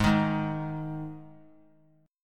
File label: G sharp 5th; strummed; x,15,18,18; 3C,3F,3A,3D; JGuitar